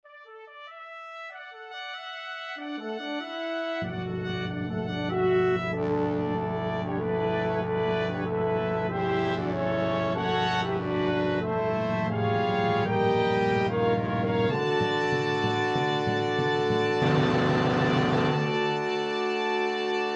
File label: Opening Fanfare